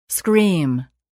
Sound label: scream